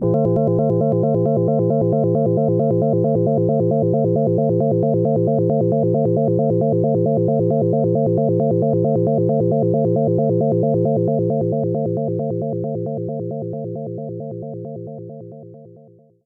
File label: SEQUENCE Linear